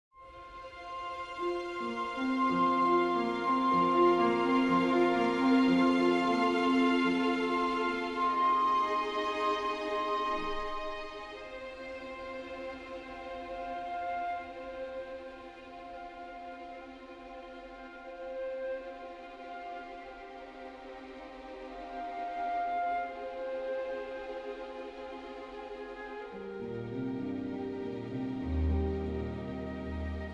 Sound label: World Music